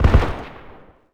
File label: polycraft end echo